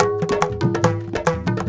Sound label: India, Heart of Asia; Spectrasonics - Heart of Asia CD2